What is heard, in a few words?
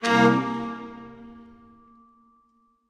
music puzzle note up